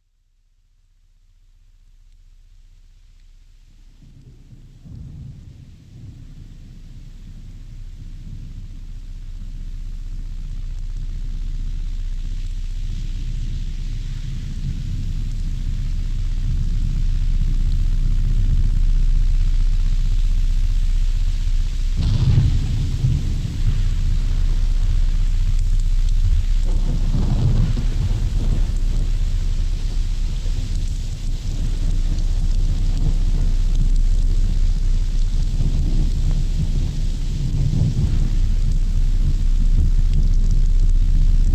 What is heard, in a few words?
Rain, early electronic music